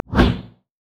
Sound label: shadowwalk; LoL; evelynn